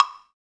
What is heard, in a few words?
PRC BLOK, AFRICAN PERC; Roland LA